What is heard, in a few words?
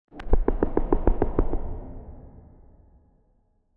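cinematic anime style sound, hard floor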